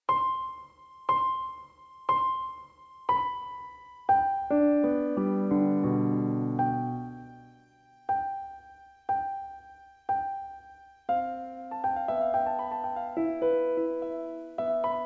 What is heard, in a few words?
Piano